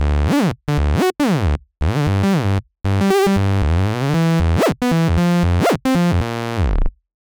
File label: bass